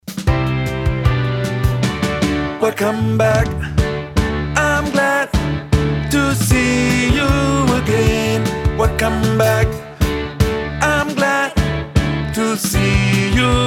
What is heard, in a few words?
Tenor